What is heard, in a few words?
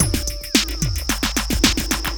808 odd beat fill; Sonic Foundry (Sony Creative Software); Ugly Remnants VOL-2